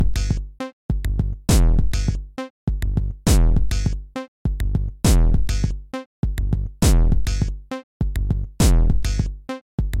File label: beat